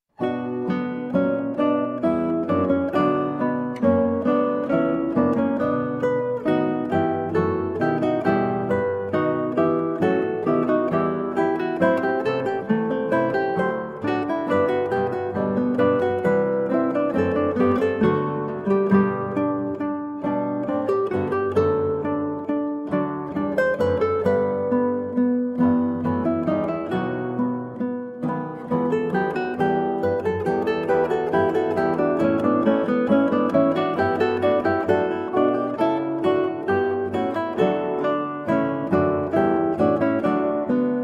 Andante from Trio Sonata in D Major, Guitar; TWV 42 D4; Georg Philip Telemann

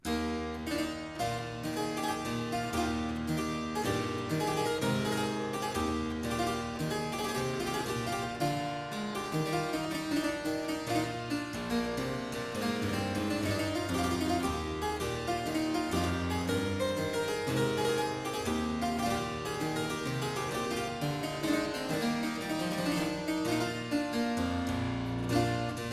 Suite in F major Sarabande, Harpsichord; Jacques Champion de Chambonnieres

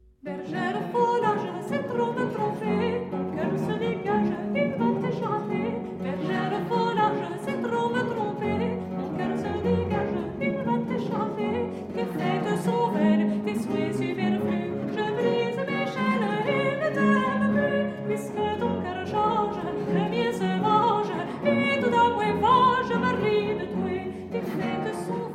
Massepied; Michel L'affilard; Airs De Cour Francais Du XVIIe Siecle

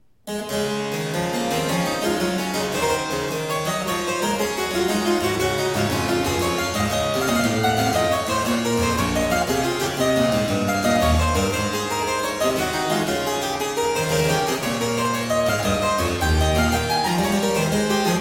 Suite in E flat major Courante; Suite No 4; BWV 815; Johann Sebastian Bach; French Suites

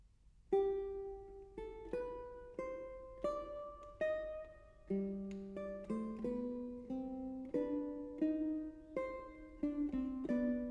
Fantasia del quarto tono 4 course guitar; Alonso Mudarra

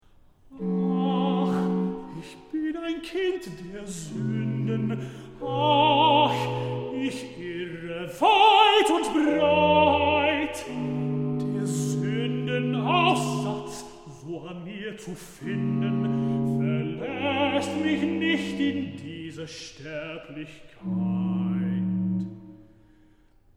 Cantata Recitativo; Jesu der du meine Seele; BWV 78; Ach ich bin ein Kind der Sunden

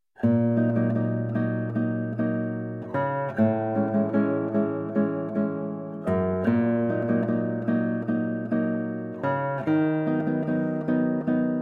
Prelude in E Flat Minor; Preludes and Fugues

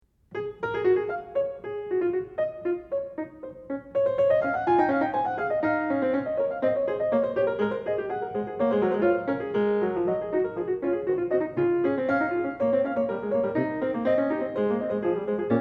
Fugue In C-Sharp Major, Well-Tempered Clavier; Johann Sebastian Bach

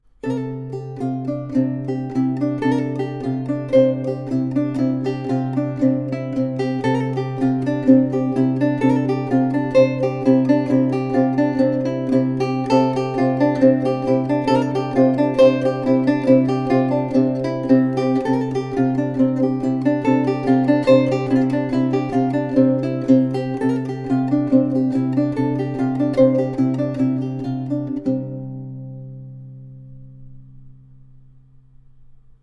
The Bagpipes, Lute; anon

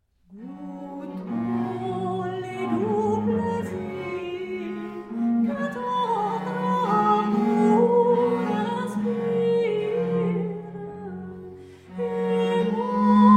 Sarabande tendre; Michel L'affilard; Airs De Cour Francais Du XVIIe Siecle

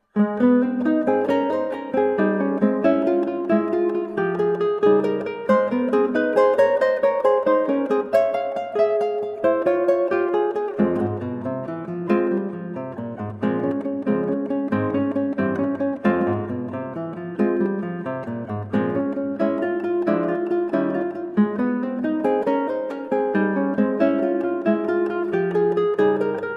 Prelude in A flat Major; Preludes and Fugues

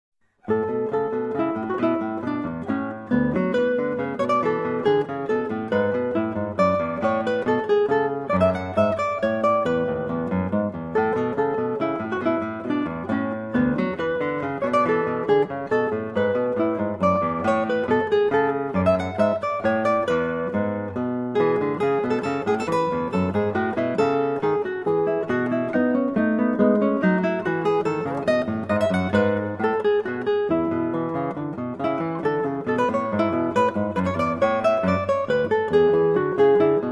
Minuet, 8 string guitar; Anna Magdalena notebook (1725) BWV Anh 118; Johann Sebastian Bach

